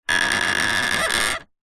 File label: wood handle turn creak; foley